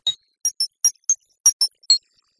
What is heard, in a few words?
Glockenspiel